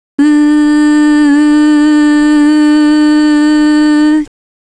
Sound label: mm-mmm